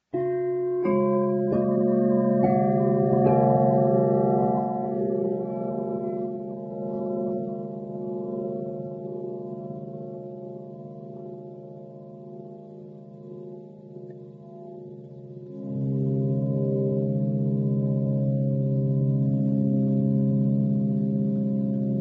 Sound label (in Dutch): 5 elementen kristal alchemy; 5 elementen set